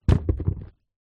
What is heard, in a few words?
rollerskate down on ground; sport